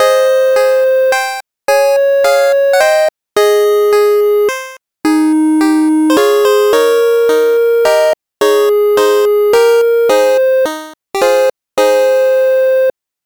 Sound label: gameover